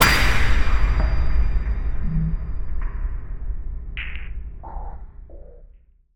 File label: whipcrack smile, Cinematic Metal Impacts